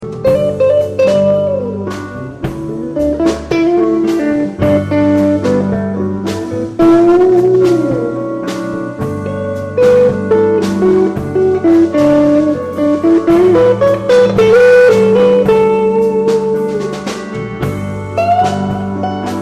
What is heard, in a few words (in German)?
jazzpop ruhiges solo